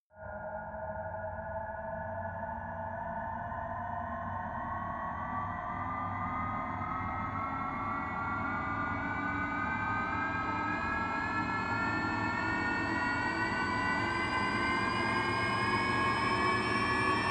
turbine start long

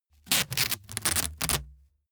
package tape dispense hard

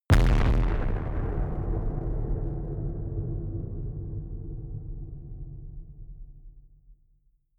distorted explosions stereo

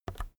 keyboard external key release soft

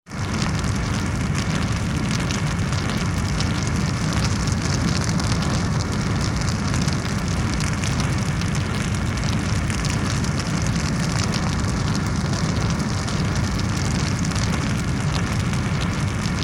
diffuse crackling atmo

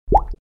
water drop

